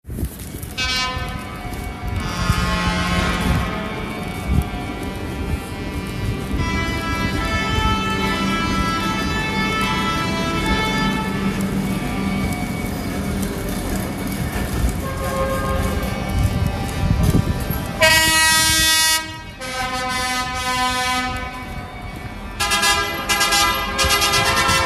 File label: tractors, uk farmers tractor protest; soho london uk